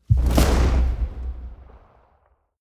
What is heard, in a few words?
soft gunshot whoosh; schusswaffen